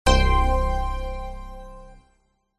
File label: multimedia game sound bold warm 70s retro synth complete